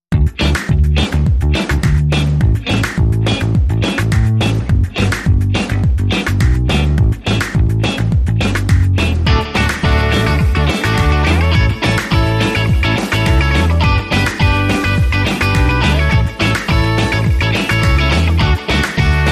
Happy Surfer Advertiser

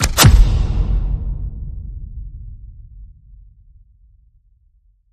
Lock n Load Hit

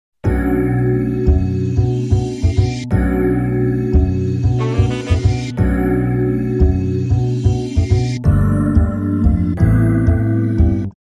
Bluesy Hip Hop

